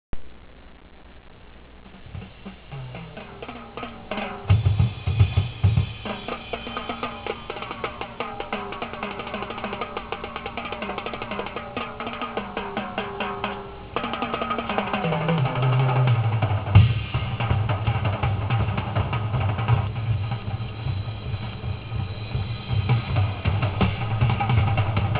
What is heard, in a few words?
drums